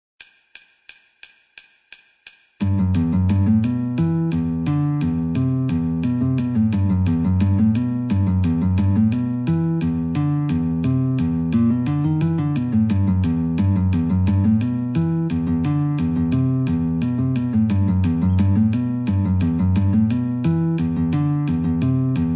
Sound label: Guitar metronome 88